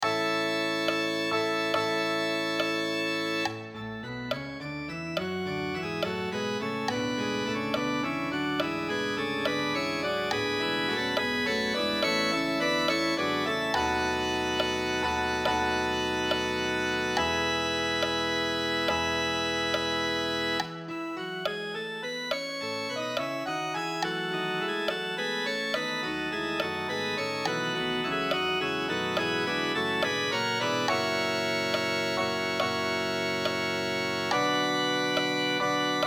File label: coro metronom; bach johann sebastian; magnificat; gloria patri